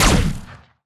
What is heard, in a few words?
Wallhit Spreadbullet; disney star smash; android